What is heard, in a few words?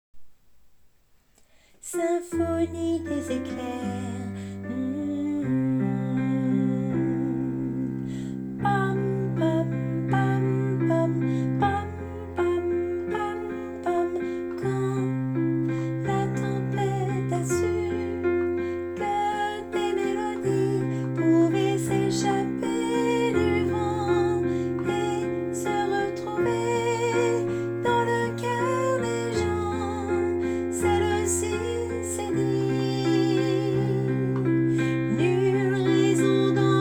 soprano